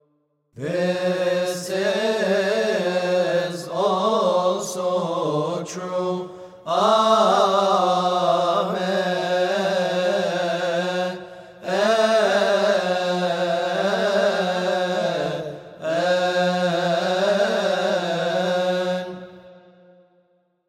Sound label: This is also true. Amen; Institution Narrative Precious Blood